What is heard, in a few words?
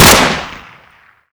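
wpn fire mp44 player punchy; weapons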